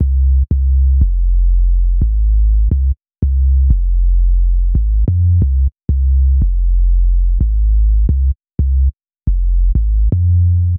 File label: Bass, Hip-Hop